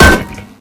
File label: damage metal